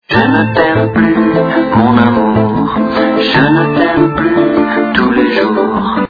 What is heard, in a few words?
bongobong